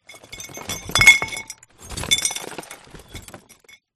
broken glass in cardboard box movements; zapsplat

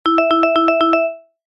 cartoon vibraphone flutter; zapsplat

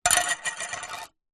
foley tin metal lid down on wooden surface; zapsplat

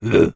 human voice male grunt grumble caveman

